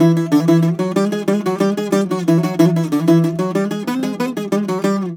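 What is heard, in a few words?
E, bouzouki; USB Soundscan vol.53 - Mediteranean Traditions; AKAI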